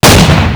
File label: fire, weapons